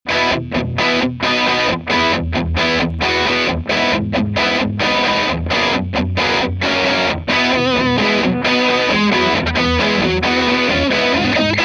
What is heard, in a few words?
Tiny Terror TT15C-12 Dirty Les Pauls; WCR